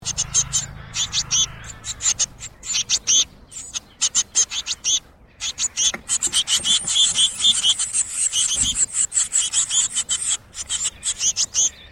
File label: blue tits feeding young